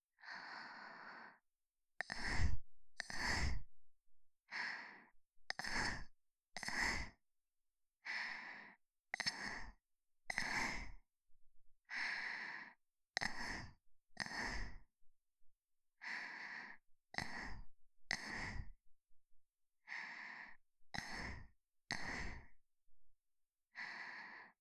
あんあん, ASMR, 左耳

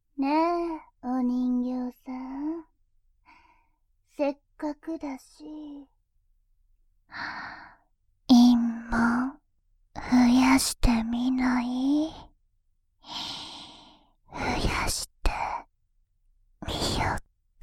耳舐めバイノーラル

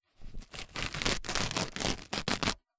びりりー, 効果音

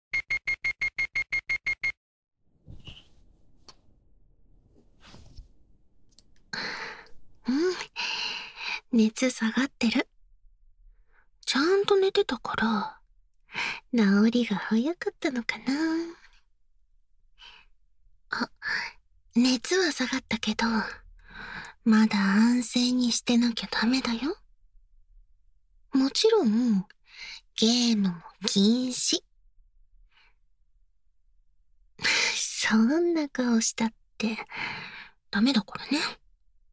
耳かき; 耳かきって、癒されるんだよ…

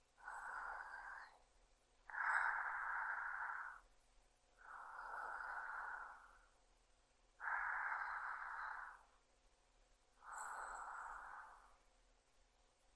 寝息

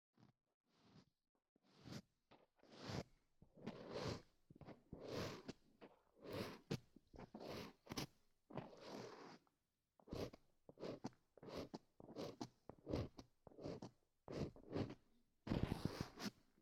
耳かき音30分 R

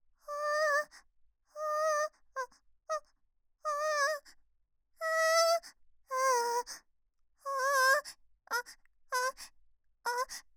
あーーーーーーー

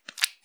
くちゅ音単発, ASMR

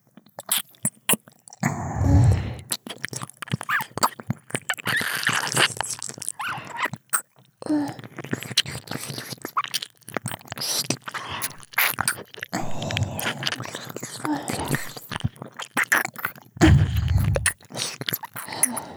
ひたすらダブル耳舐め, ASMR

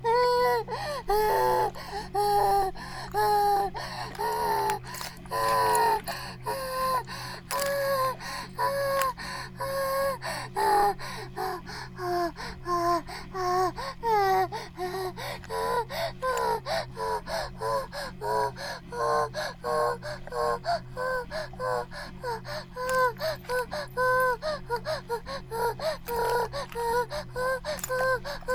バイブ放置; イクたびにわけて

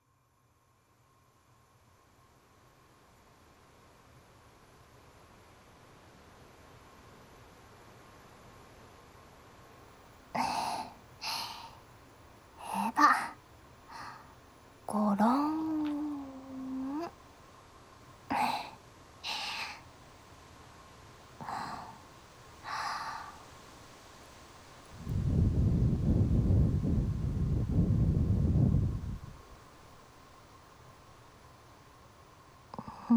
耳かき（左耳）, ASMR; サキの耳かき

